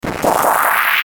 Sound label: Ascend Delay Static Flange; Blastwave